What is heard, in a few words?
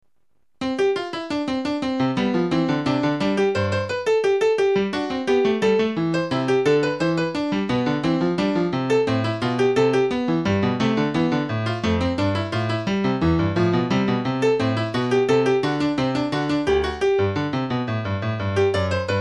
Twopart invention in C Major; Weissenborn organ